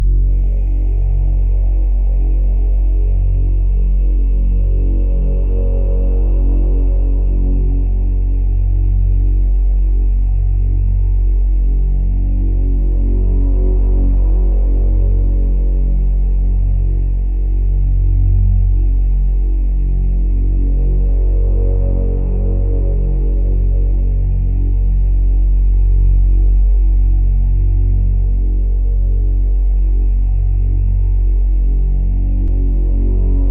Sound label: Foyer Ambience; Foyer (Tutorial, Ambience)